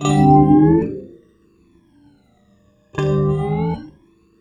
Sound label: FX GUITAR; USB Soundscan vol.31; Miro s Grooves; AKAI